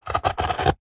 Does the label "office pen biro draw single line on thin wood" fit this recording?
Yes